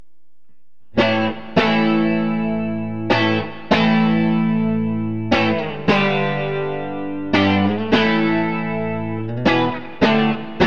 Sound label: bridge clean; marshallhead; burny; fugly clips